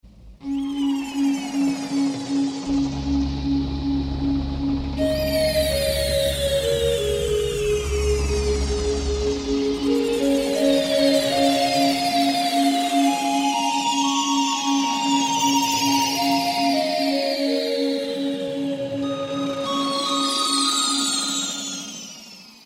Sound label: Honto, Yamaha TG500